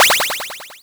twink

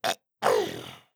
Minion Die Impact

